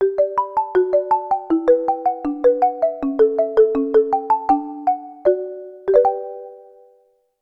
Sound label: Delicate, ringtones; China Telecom